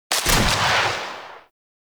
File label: Heavy Grenade Launcher Shot; Weapons; Warframe; Archwing